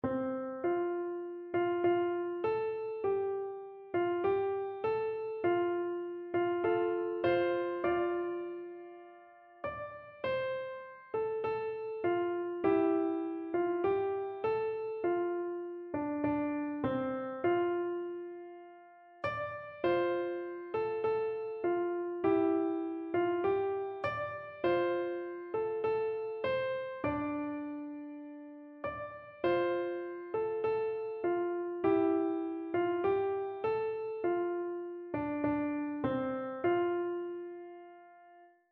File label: auld lang syne, piano